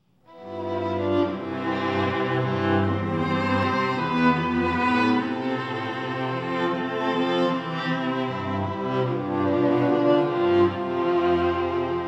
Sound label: String Quartet, Annie Laurie; Winnipeg Canada